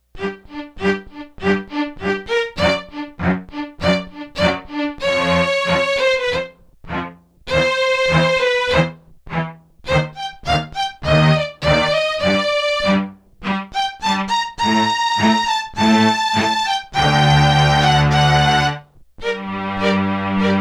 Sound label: BOWED STRINGS